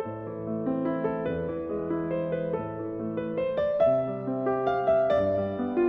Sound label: music